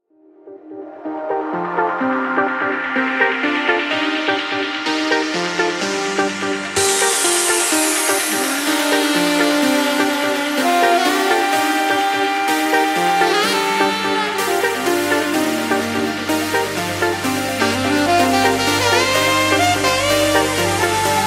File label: DJ and Sax